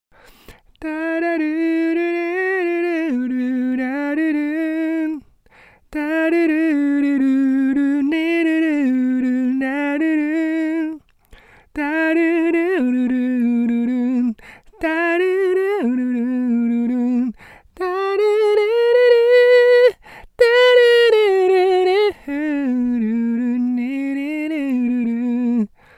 vocal

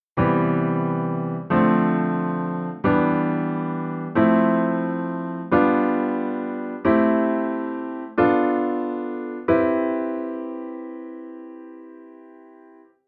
diatonic chords

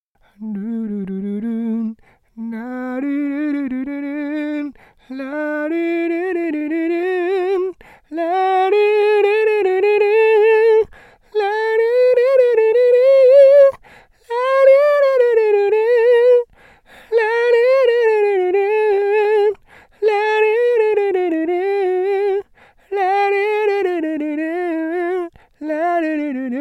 vocal

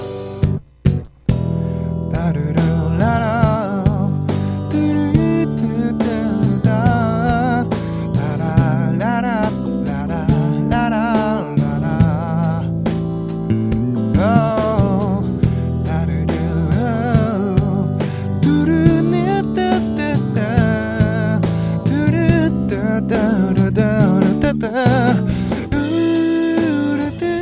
bass

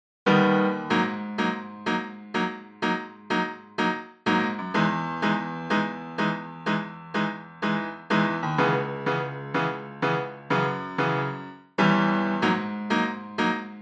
piano eq

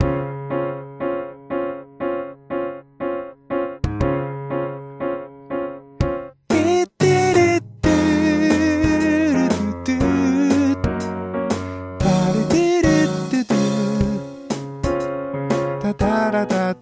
last drum

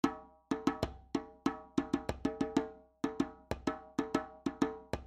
djembe

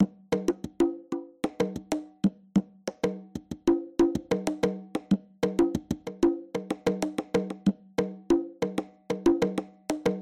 conga grit after

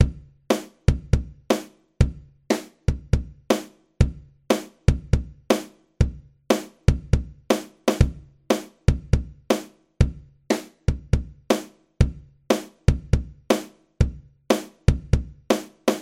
solo

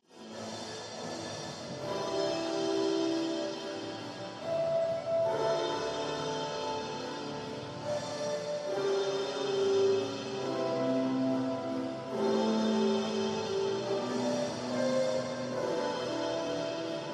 rock remix

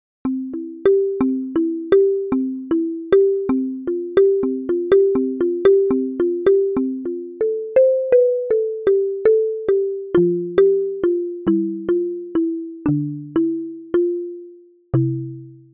PC Mallet

